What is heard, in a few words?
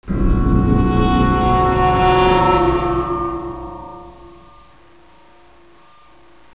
scary